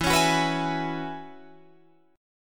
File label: E 6th Add 9th E down Guitar Drop D